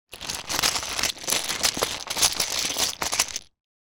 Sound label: game letter tiles in cloth pouch hand put in and move around; scrabble